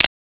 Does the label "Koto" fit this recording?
No